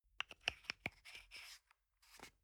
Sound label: toothpaste screw cup close soft; haus-garten; badezimmer